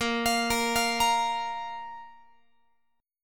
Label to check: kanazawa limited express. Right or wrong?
Wrong